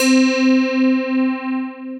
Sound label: Phaserina, Synth Pad; Instruments; Ableton; Synth Essentials; Tension